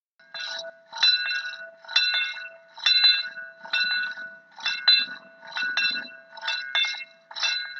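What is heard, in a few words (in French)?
SONNERIE CLOCHE; JUILLE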